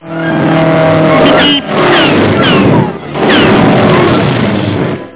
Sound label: start windows; road runner